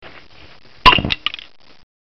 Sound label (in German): plopp